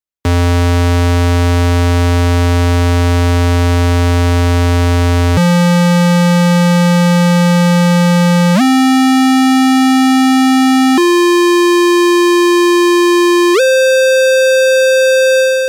Rechteck B

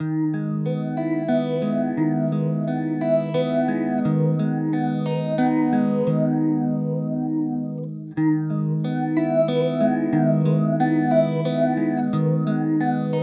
Lethargy Gitarre; Dreadbox

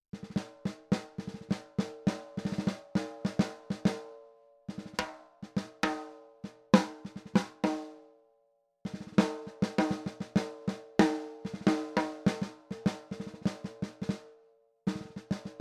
PDP Snare Klein tiefe Stimmung

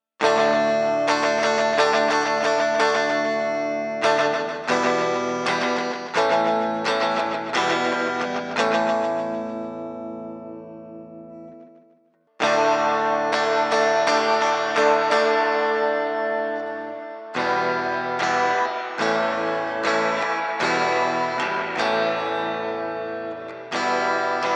E Gitarre plus Aphex Effekte; Rode RODECaster Pro II

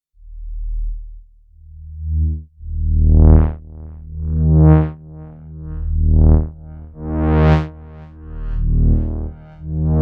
kleine filterfahrt

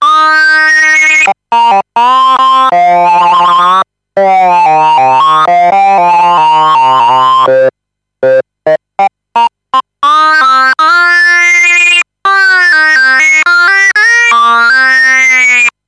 Sync Lead ohne Effekt

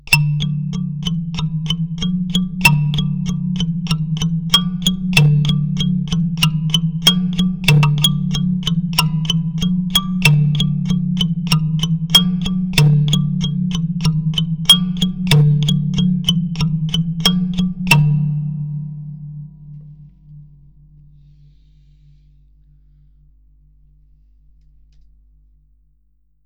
Soundbox Mk2 Kalimbazungen tief gestimmt

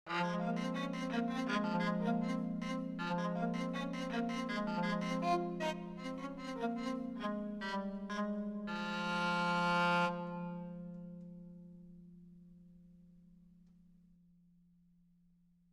Fidel

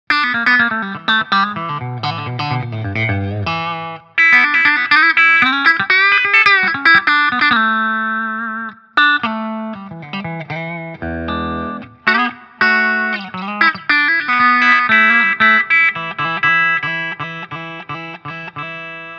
Harley Benton HP 42 clean Mitte Steg als Singlecoil

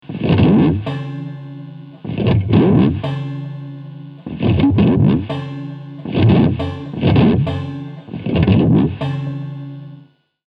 Jackson JS32Q DKA Dinky E Gitarre Federklappern

